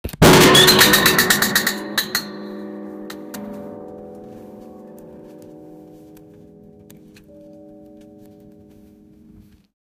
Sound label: sound of a piano crushing; body